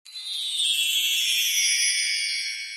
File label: slow grooves